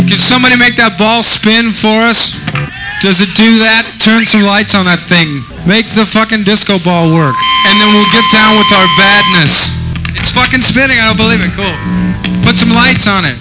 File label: disco